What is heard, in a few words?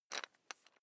electric drill bit fasten wrench turn very fast